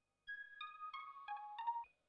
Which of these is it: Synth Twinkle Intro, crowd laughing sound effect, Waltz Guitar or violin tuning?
Synth Twinkle Intro